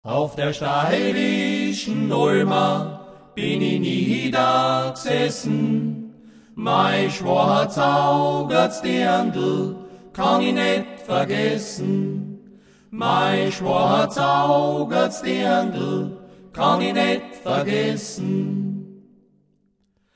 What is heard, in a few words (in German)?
weststeirische gemuetlichkeit